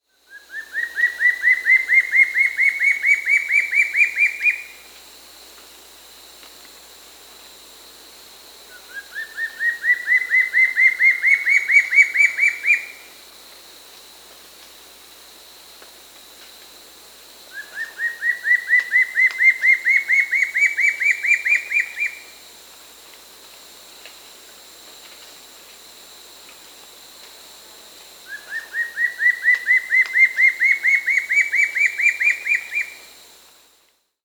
Undulated Antshrike (Frederickena unduligera), Peru; S. America